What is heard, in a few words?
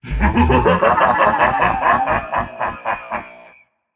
laugh, voicepack; smite; jorm